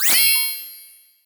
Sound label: Lock Search; Blastmorph Pinball; xbox series xs